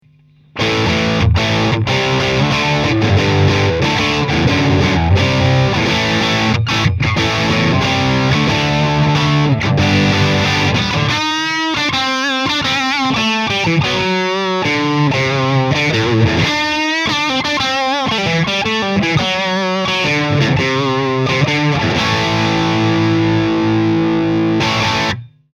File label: Strat Am Pro JCM800 dist puente